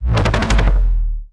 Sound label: Jolt Standard